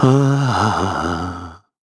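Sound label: Hum, Korean; heroes; Shakmeh; kings raid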